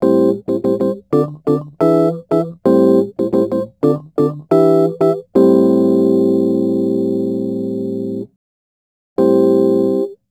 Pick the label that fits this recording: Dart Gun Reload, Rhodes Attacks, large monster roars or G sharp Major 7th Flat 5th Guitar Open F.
Rhodes Attacks